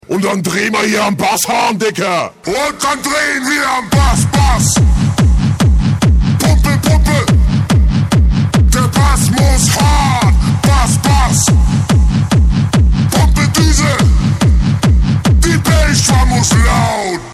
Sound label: bass; css; skyesports